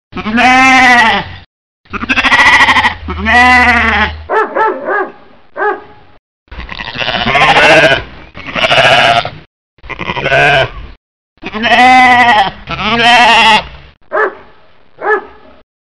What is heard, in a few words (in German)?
Schafherde mit Hund